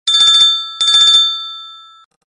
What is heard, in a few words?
ring